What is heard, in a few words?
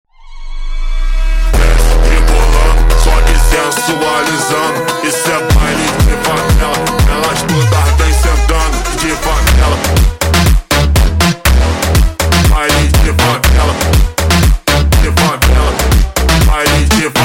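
sped Up, phonk music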